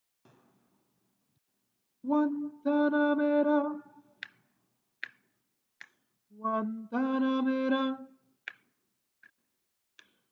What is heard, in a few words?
tutti tenor